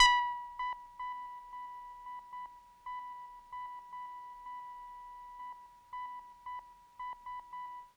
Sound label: Kawaii B4; keys